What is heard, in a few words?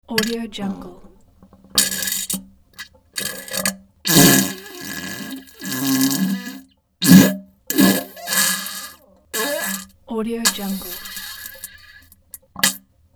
pushing fart in toilet bowl